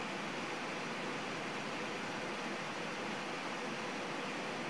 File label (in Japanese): ゲーム中のファンの音